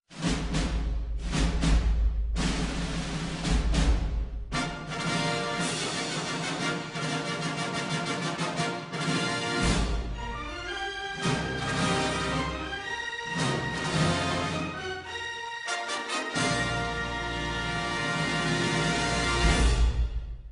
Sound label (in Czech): hudba